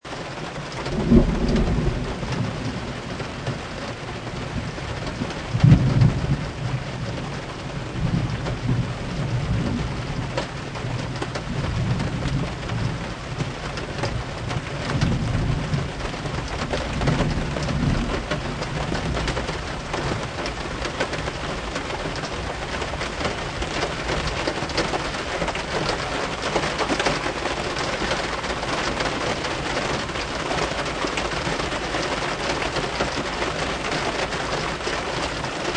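Rain Car Thunder, Rain beating on car, windshield wipers, thunder; Car in Rainstorm; Weather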